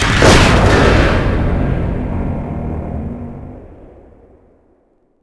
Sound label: distant tank destroyed; WAR